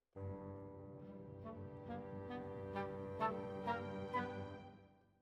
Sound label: Flute